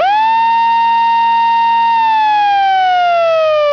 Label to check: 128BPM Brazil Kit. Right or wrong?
Wrong